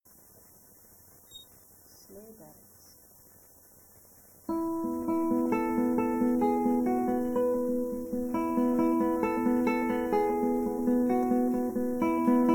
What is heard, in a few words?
Sleigh Bells; Scenic Music for Guitar Beginners